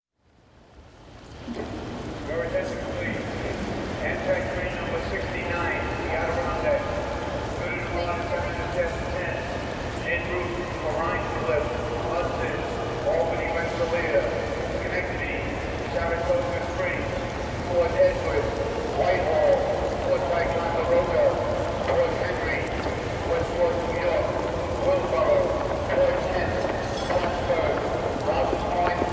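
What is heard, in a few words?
train station sounds crowd, English speech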